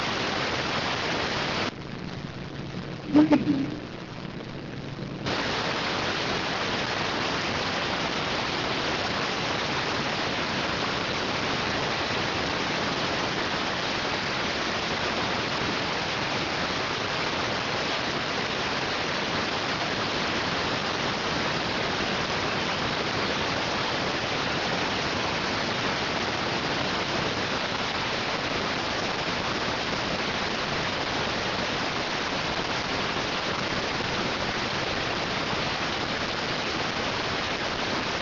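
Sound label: radioteletype receive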